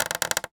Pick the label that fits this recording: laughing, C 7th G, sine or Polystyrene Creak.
Polystyrene Creak